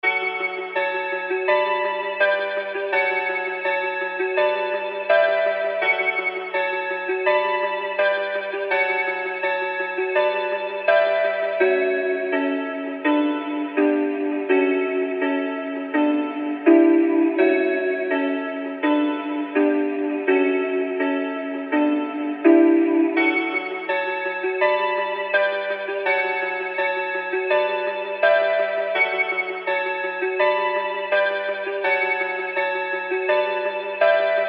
F min 166 BPM Piano Keys

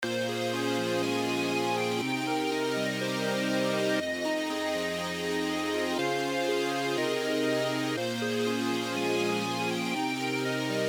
Serum Chords

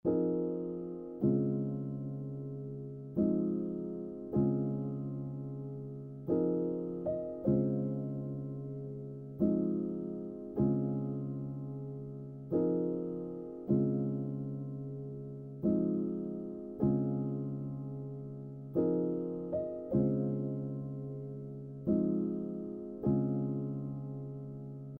Melody Loop Fmin 154 BPM Piano

